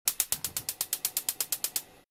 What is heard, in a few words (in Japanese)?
gas konro